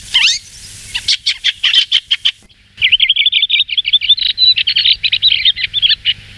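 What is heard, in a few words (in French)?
grive mâle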